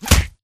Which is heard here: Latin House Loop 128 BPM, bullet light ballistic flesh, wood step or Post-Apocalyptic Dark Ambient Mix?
bullet light ballistic flesh